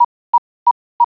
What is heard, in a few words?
radar